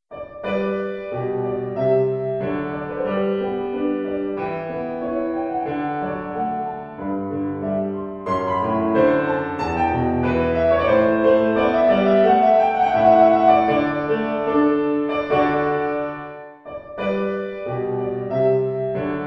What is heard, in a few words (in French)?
piece de clavecin; purcell henry